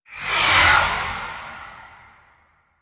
Dementor Dark Flyby

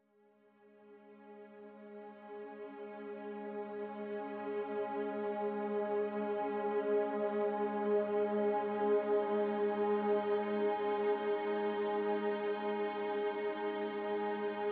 Spooky Mix

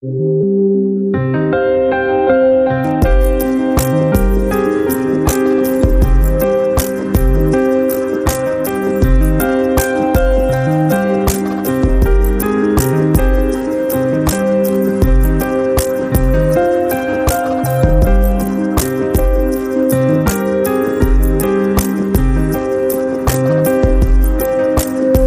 Pentatonic Mandala

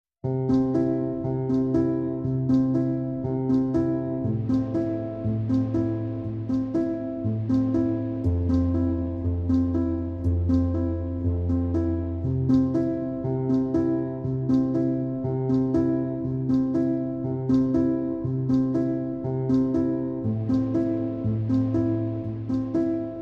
Low Piano Only Mix

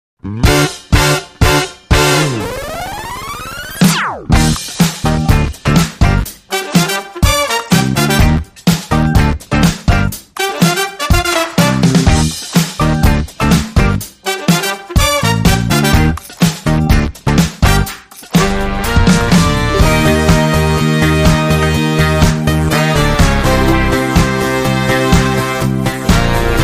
Push Up Brass

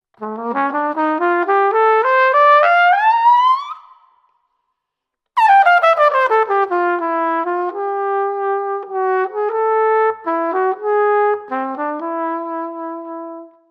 Quirky Jingle

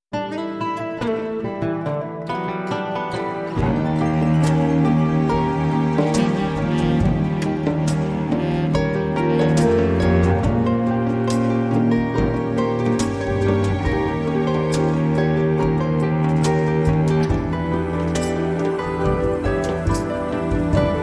Smooth Dream, Guitar Opening

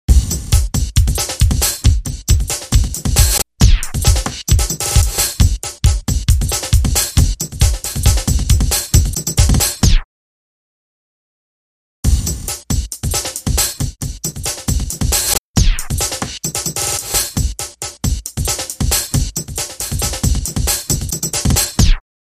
Rhythm Hot Shots

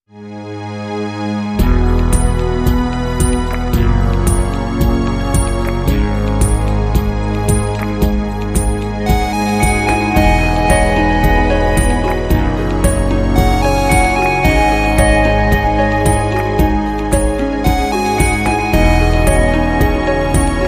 Electro Orchestra

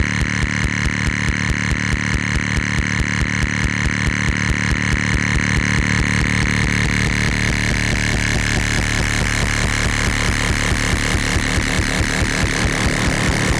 Pulse Cutting Away

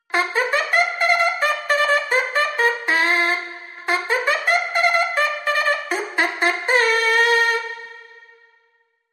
Call To The Races; Trad (PD)

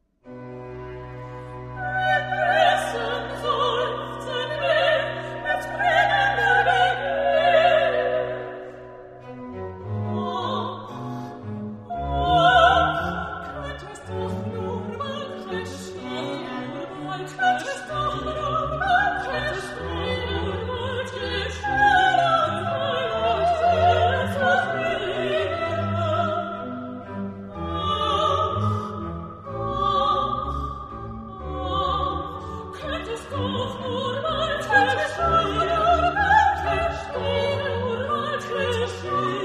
Easter Oratorio Recitativo; Indessen seufzen wir; BWV249; Johann Sebastian Bach (PD)

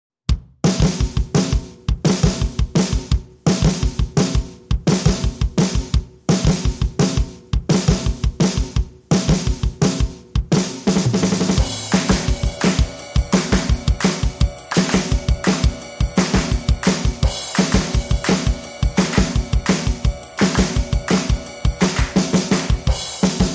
Drums Only

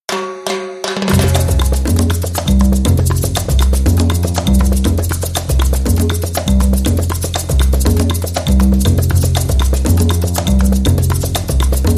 Big Band Mambo, Drum & Bass

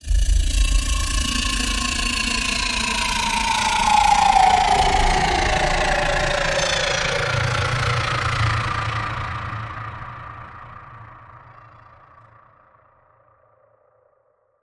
Astral Spaceship Landing

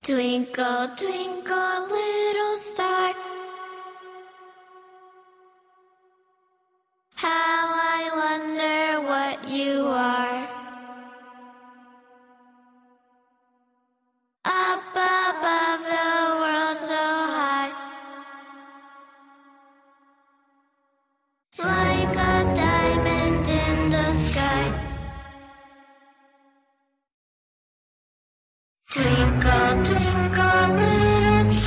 Horror, Vocals Only

